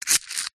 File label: leisure toy aerial disk launcher cord pull spin